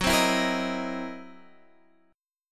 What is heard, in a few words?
C 7th Sharp 9th Flat 5th Gb